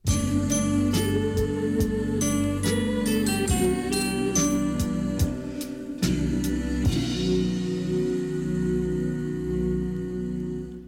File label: topformat maxi jingle single